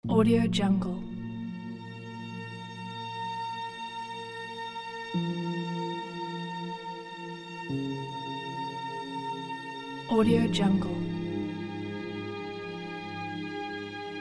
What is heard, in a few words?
dramatic emotional deep ambience; p4lash